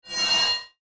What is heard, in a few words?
Sci Fi Metallic Pass By; Spacecraft; Blastwave